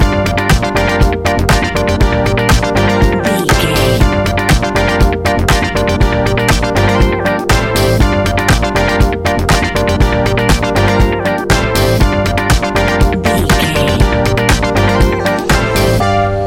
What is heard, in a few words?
casual cool cadence, A relaxed and chilling piece of music